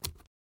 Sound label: hover; snakeinabox